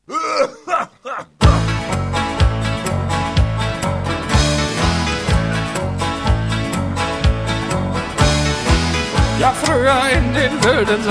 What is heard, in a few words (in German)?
raucher hust